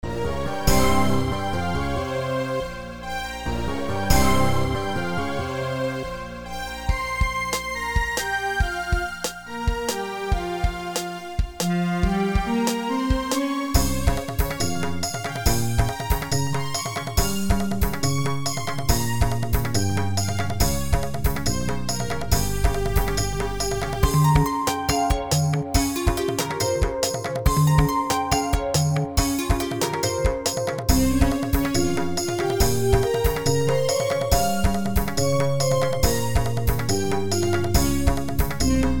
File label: revathi